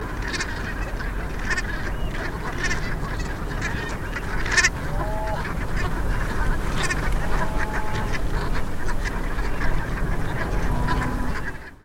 ringelgans

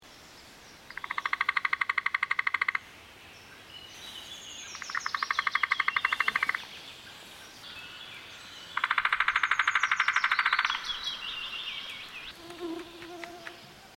weissrueckenspecht; Picoides leucotos; Spechte

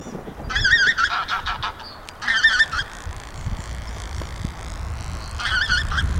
tundrasaatgans

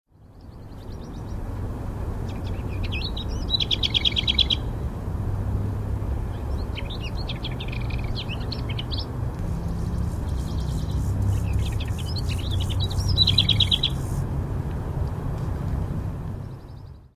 klappergrasmuecke

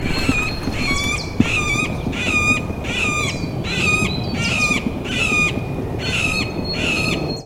mittelspecht; Picoides medius; Spechte

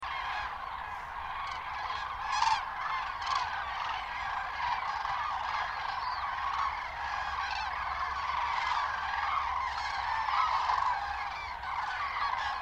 kraniche

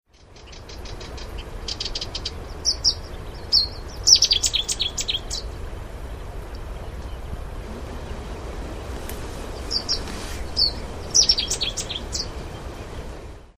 seidensaenger

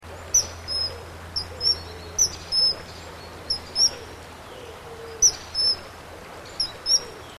eisvogel; Alcedo atthis

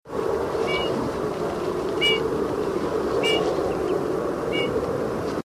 gimpel troet

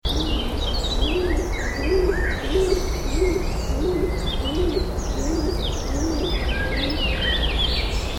hohltaube, vogelstimmen

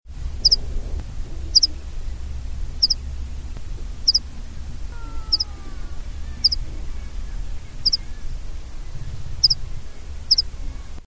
wiesenpieper; Anthus pratensis

